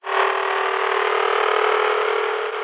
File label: science fiction radio static interference growling buzz